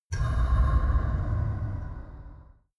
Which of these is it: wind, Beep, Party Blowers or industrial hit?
industrial hit